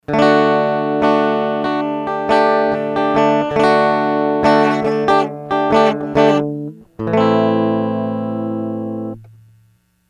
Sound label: Dry Guitar; Sony HR-GP5